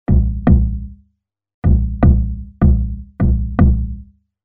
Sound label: Solid state bass drum